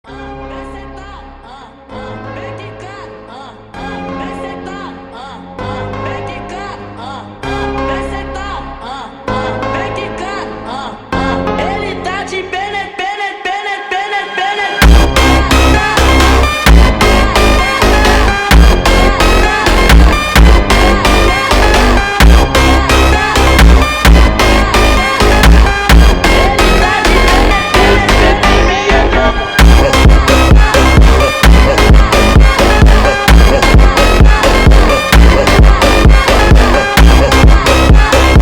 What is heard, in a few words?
funk infernal; tancevalnaja muzyka